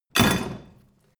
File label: crash medium chain smash